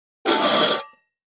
Coffee Can Scrape On; barrels buckets & cans

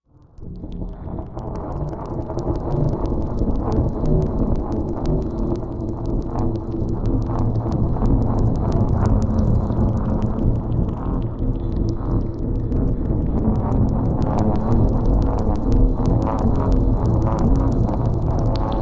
Deep Click Machine Click, horror

